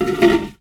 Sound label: Garbage Can Movement; Office Supplies; 1001 Sound Effects